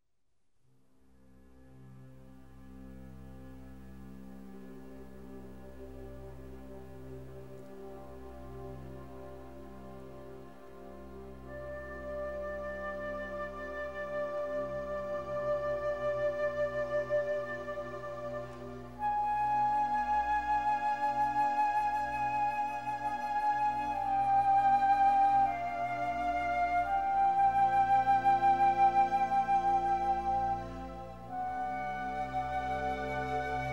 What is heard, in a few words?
Flute Concerto In G min; Vivaldi